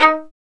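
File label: violin, marcato; Violin 4 nv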